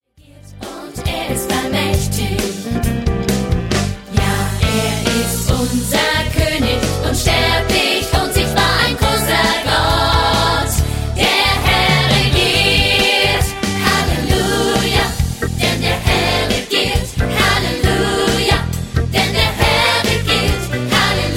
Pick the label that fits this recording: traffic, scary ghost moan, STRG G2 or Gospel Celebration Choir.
Gospel Celebration Choir